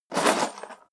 toolbox handling rattle

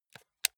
console controller playstation press slow

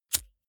lighter wheel spin flame burst

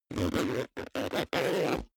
zipper backpack close unstable

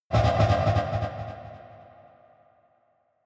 whoosh large flutter

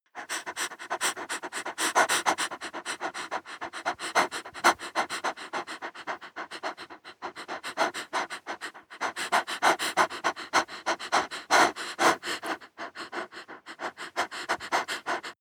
breath female fast shallow

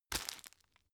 tissue grab

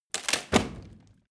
rotten wood break

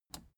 plastic button scratch smooth push disable

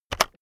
keyboard external enter press very fast heavy

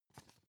magazine page handle

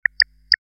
button activation

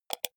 computer mouse wheel click fast

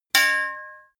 knives large hit chink

